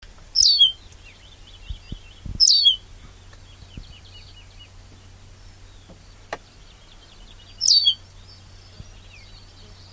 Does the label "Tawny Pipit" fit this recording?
Yes